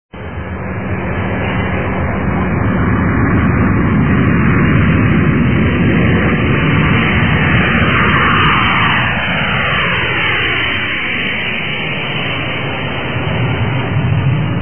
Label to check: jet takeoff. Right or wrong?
Right